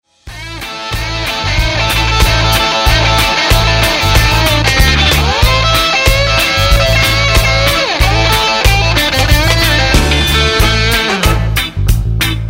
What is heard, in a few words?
fire dub